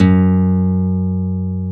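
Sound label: GUI NOTE, acoustic guitar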